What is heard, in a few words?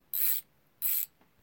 Ratchet wrench